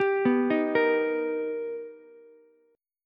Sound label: B Augmented Major 7th B Ukulele, chord; B+M7; arpeggiated; JGuitar